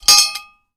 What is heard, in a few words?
Metal Clang